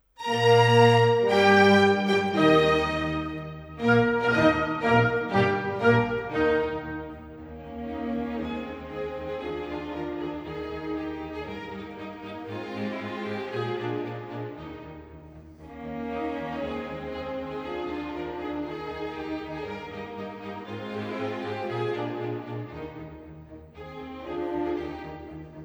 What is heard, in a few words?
Concerto for Two Horns in Eb Major, Concertante, Allegro